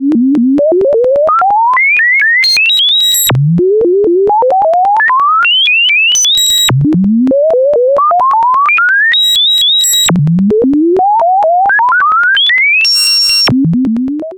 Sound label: Waverazor Dual Oscillator FM Sine OSC CV In; MOK